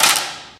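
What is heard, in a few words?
physics clipboard impact medium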